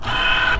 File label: Door Handle, Tesla